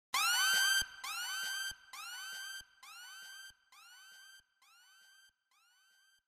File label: siren; kill bill